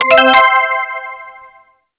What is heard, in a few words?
All Clear